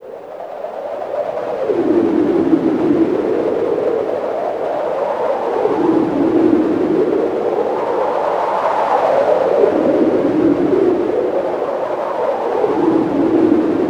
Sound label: atmo, atmosph, special fx twisted